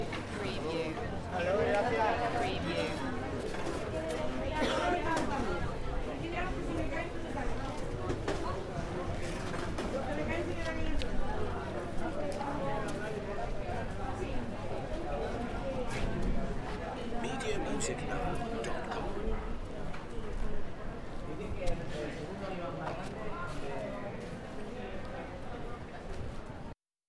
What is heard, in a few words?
Spanish street crowd talking; beanstalk audio